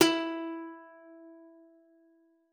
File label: E4, guitar; Xsample VOL-2